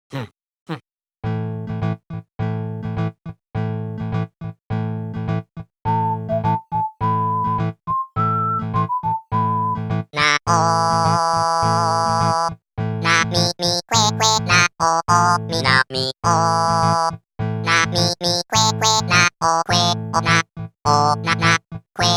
Folk; animal crossing new horizons original soundtrack 2 2022

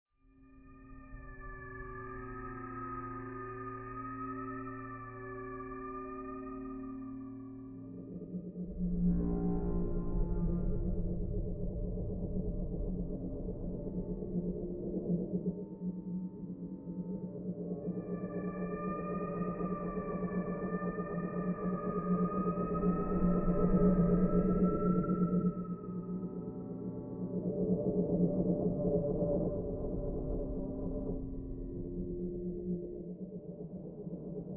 Crash Tension

